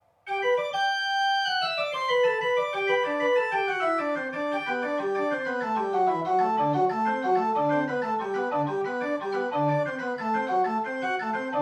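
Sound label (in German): Schulte, pfeifenorgeln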